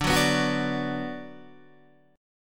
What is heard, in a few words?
D 6th D down Guitar Open D, chord